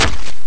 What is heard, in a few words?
axe hit bod; weapons